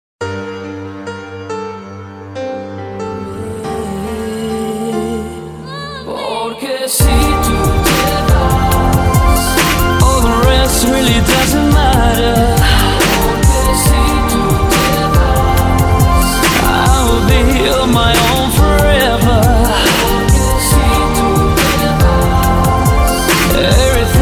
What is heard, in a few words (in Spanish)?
tono de llamada